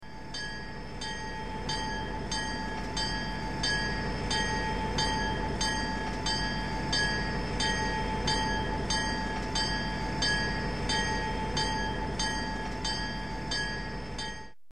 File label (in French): clochette passage a niveau